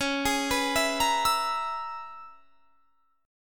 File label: Db Minor 13th Db; arpeggiated